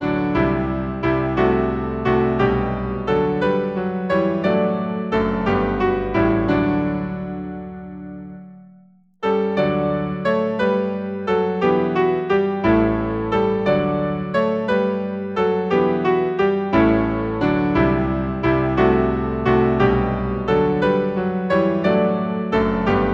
Hymn, piano Organ; Now greet the swiftly changing year, Sixth Night

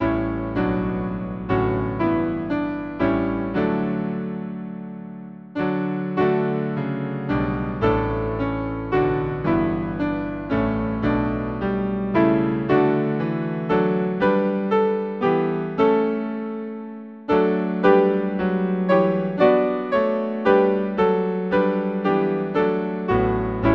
Hymn, piano; We Walk by Faith and Not by Sight; Beati-Immaculati

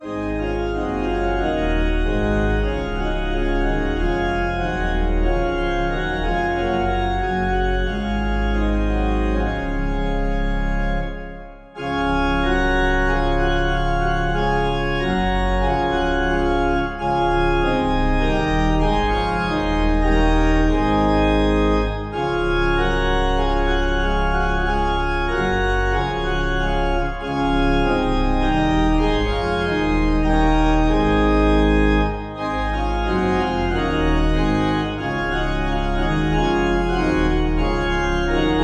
Hymn organ; Lord Dismiss Us With Thy Blessing, Sicilian Mariners

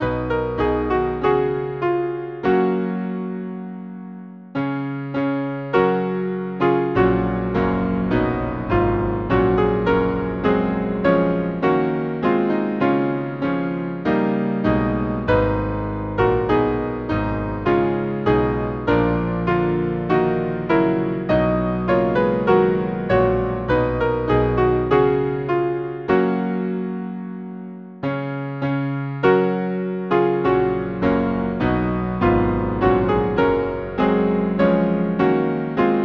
Hymn piano; Jesus Lives, Mowsley

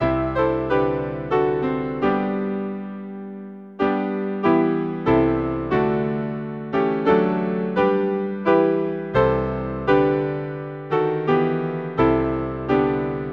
piano, America; God bless our native land

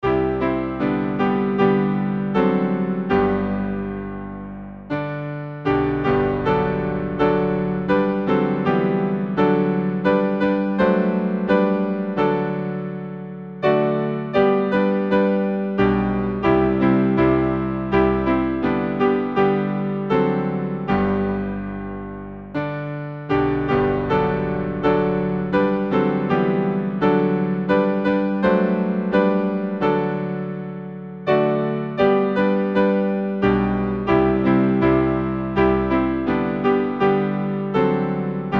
piano, Hymn; O for a thousand tongues to sing; Azmon

